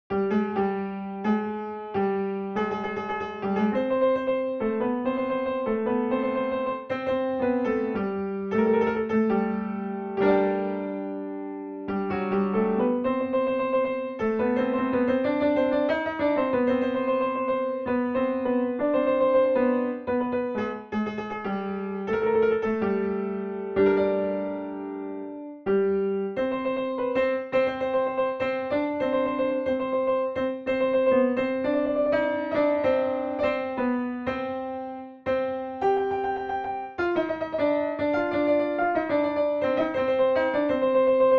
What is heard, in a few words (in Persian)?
Sepehr, piano; Rast-Panjgah; Radif Mahjoubi